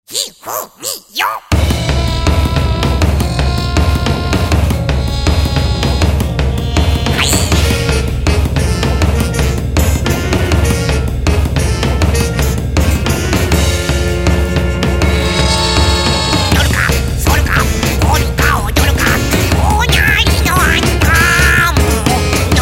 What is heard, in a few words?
polka-o-dolka (swing jazz mix)